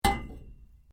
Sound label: METAL PIPE SMALL STRIKE; Sound Effects:Materials